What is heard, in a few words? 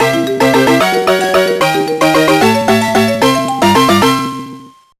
Beat Final Boss; Tak The Great Juju Challenge; GBA